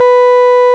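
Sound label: RMI piano B 3, EP; Sonic Reality - Vintage Timetraveler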